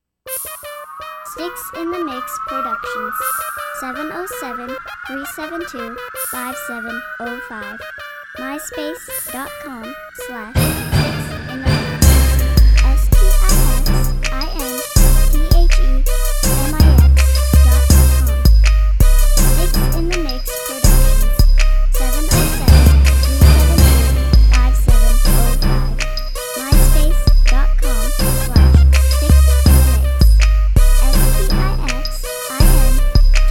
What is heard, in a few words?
crunk